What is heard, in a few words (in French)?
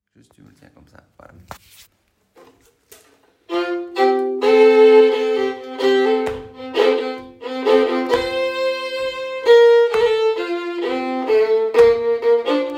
Balade irlandaise violon polka; Balade irlandaise 2